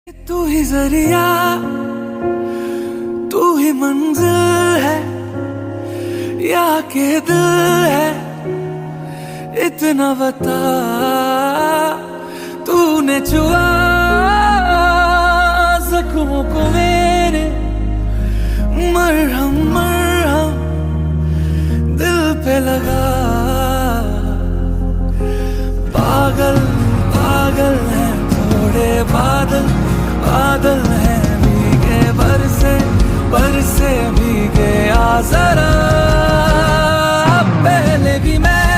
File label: Sad Song Ringtone Hindi